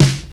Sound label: studio snare drum sample c sharp key